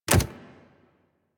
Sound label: Step Default, Foley Footsteps, Pets; Warframe; Zanuka